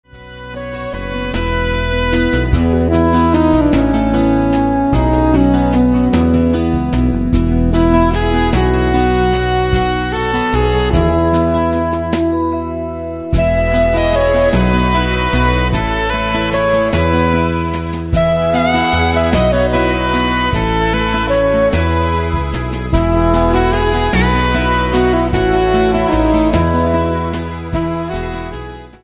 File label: instrumental